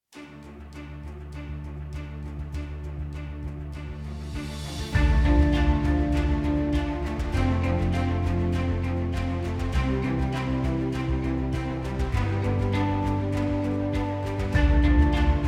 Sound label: Epic Rock Trailer; soundbay; rockpop